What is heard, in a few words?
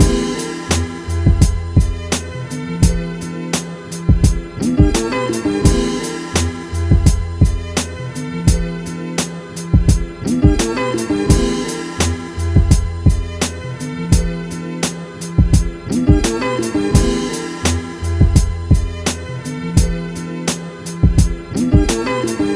beats